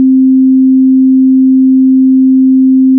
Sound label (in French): note sinus do